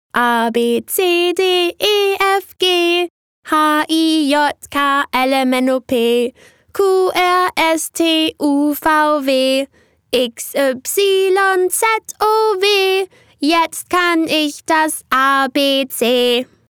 SINGING ABC Song Young Bright Happy Kids

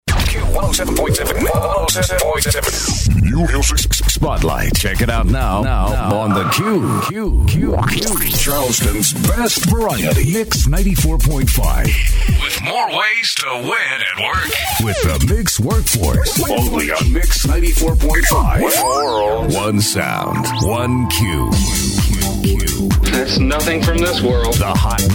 HIPHOP

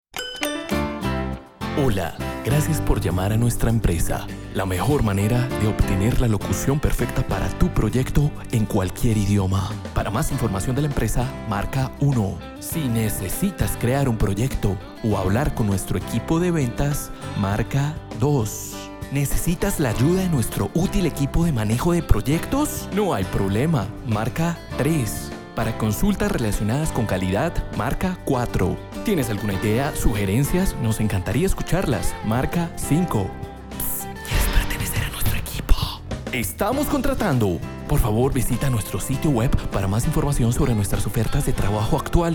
JINGLE

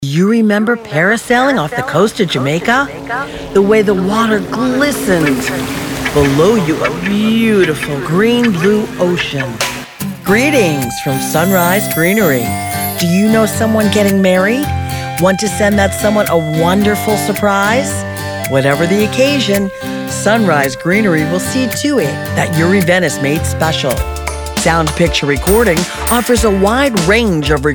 Singing commercial

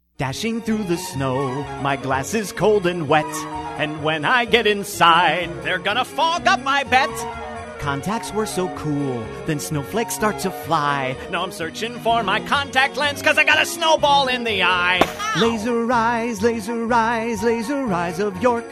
Singing Holiday

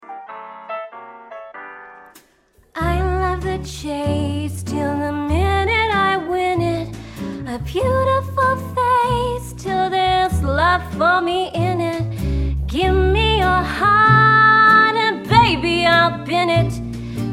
Singing

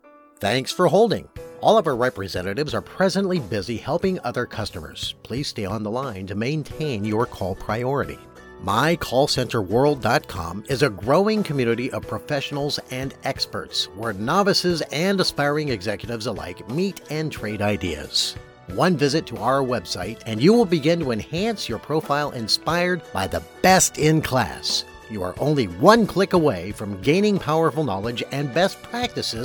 music on hold

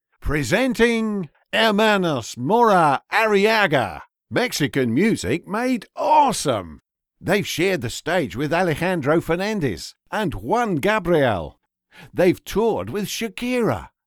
Mariachi

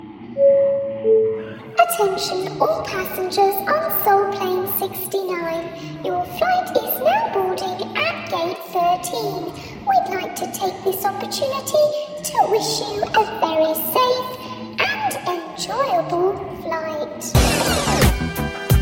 Helium jingle; Soul Plane

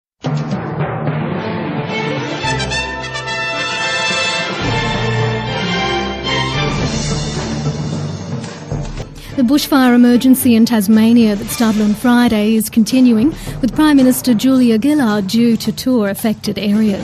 Song

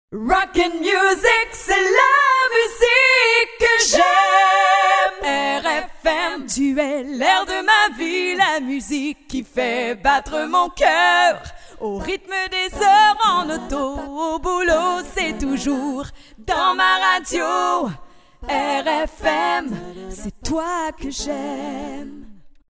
JINGLE

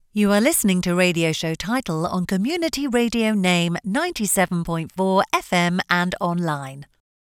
Radio Ident music

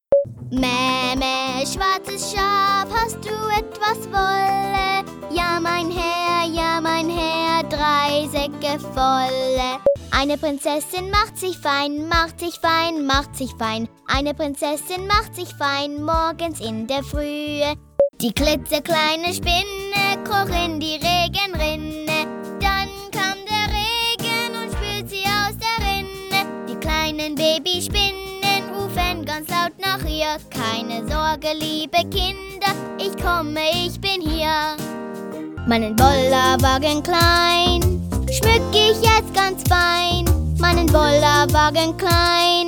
Musical GERMAN SINGING

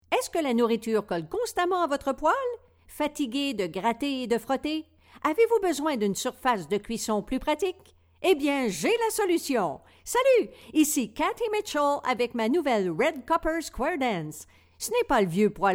SQUARE DANCE

